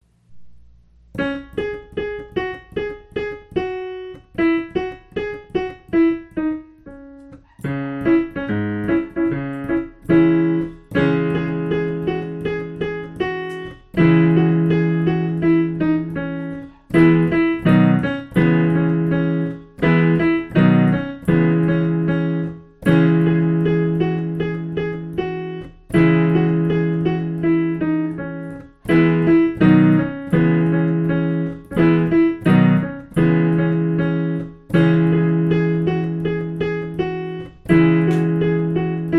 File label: piano; o voskos kai o vasilias